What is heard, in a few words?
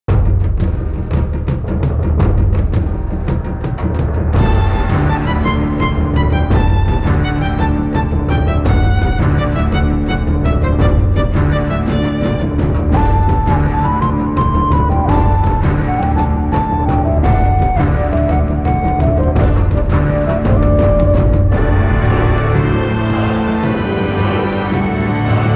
Pirate Travel Music; pirates of the burning sea game soundtrack 2007